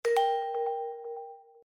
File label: notify, phone